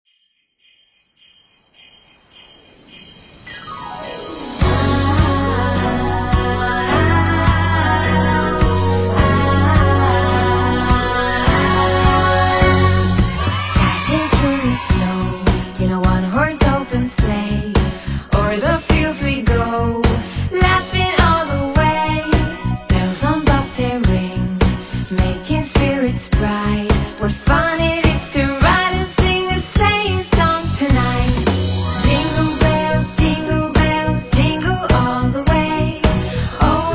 Jingle Bells Christmas Songs Popular Kids Song with Lyrics